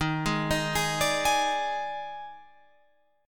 Eb Major 7th Suspended 4th Eb, Guitar, Open F; EbM7sus4 arpeggiated

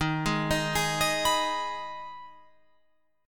Eb Suspended 4th Sharp 5th Eb Guitar Open F; Ebsus4#5; arpeggiated

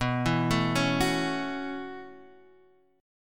A6/B chord, guitar; arpeggiated

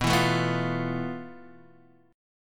F Major 7th Flat 5th B, guitar chord

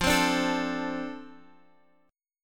F 6th F down Guitar Open F; F6 strummed

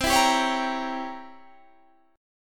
C 7th Flat 9th C down Guitar Standard; C7b9 strummed; JGuitar

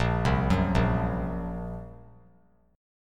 Bb Suspended 2nd Sharp 5th; Bbsus2#5; arpeggiated; JGuitar

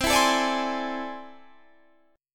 A Minor Major 11th

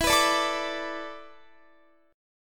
E 7th Suspended 4th, Guitar; E7sus4; strummed

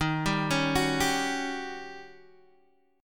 Eb 7th Sharp 9th, Eb, Guitar, DADGAD; 13,10,11,11,10,x

